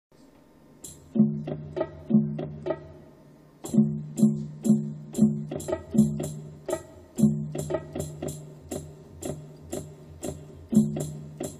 piano

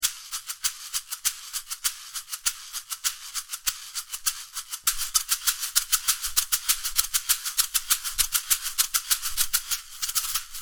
maracas

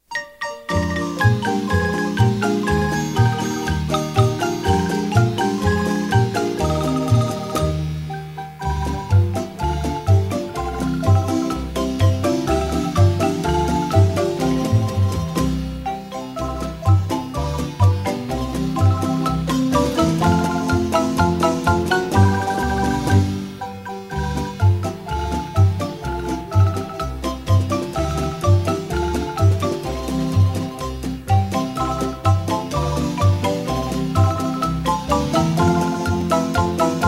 marimba

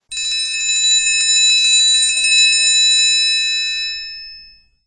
triangle riff

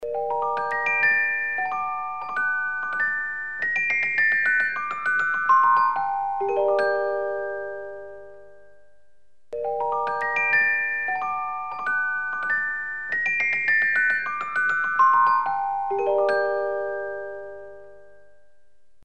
celesta

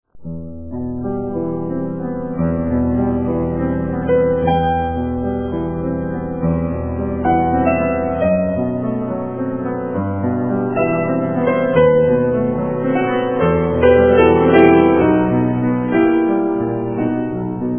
piano

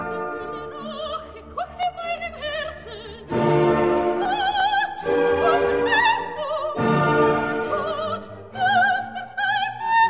Soprano

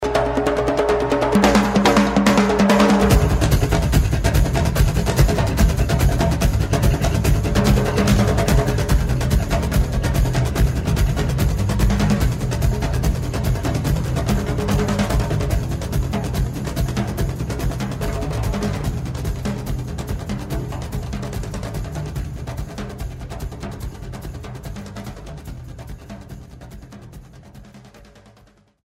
Bongos

